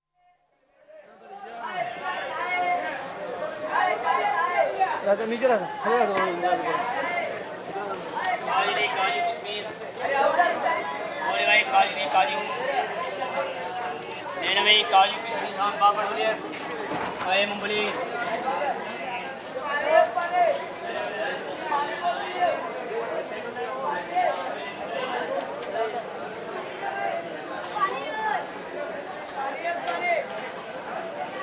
Mushalsarai: Station platform with hawkers, footsteps, distinct speech & some train noise; BBC